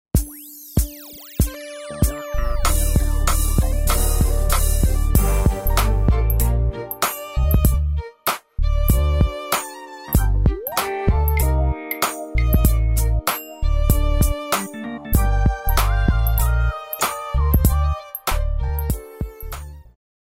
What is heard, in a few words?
Motif Rack ES; MoStreet